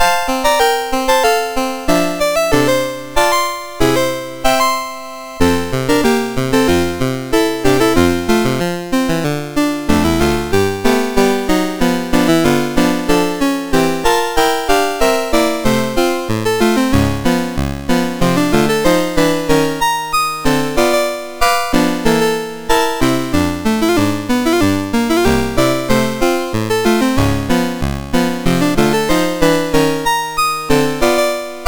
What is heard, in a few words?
We Three, MOS6581